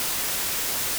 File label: noise; ivas codec